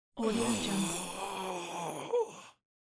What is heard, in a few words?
male in pain